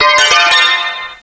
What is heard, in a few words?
preflight ding